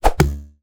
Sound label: arrow shoot hit target